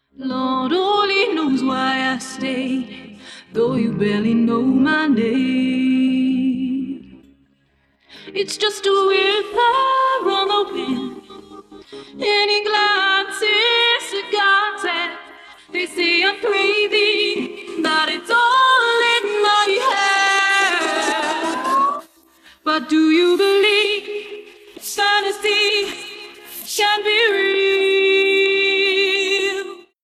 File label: Acapella